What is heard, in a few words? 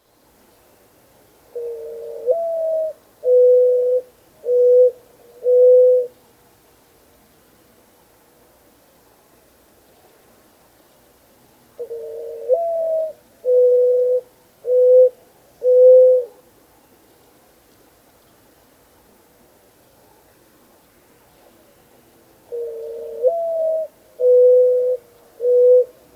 Mourning Dove Sound